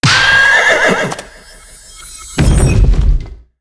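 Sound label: boss death; monster; Kenta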